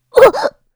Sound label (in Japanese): uguho; 女; ダメージ６ うぐほっ; 重くドスっと何かくらった; ホラーボイス・声ネタ・効果音・ＢＧＭ